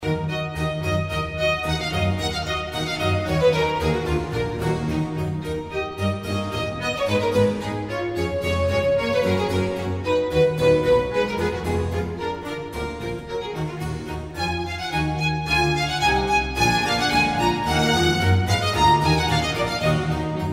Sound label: Violin Concerto in A minor Allegro; Antonio Vivaldi; Op 3 No 6 from L'estro armonico